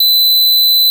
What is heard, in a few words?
Siku C6